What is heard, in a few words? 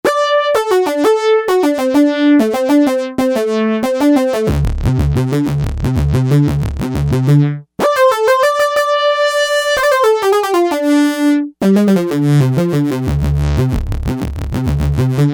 Buzzed